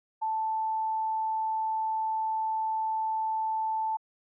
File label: sine 880hz